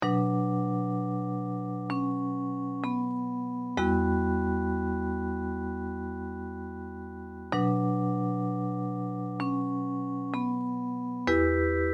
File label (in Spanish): short melodies melodia de la soledad